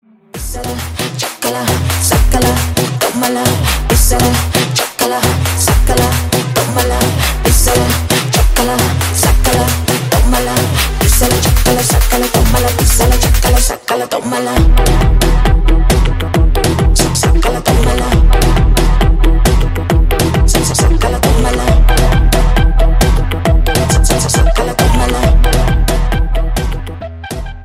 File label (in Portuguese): Funk Rave